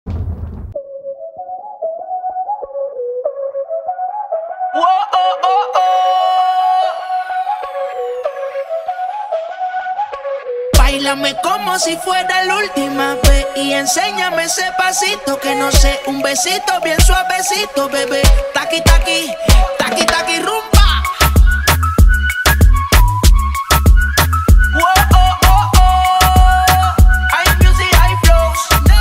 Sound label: trance ringtone